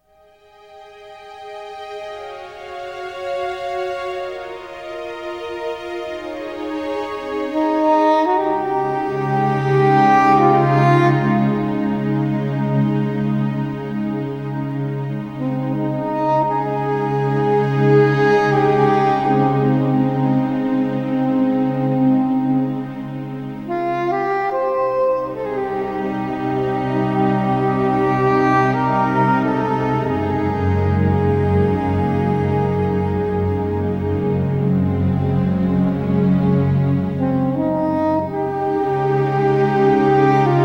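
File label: soprano sax and strings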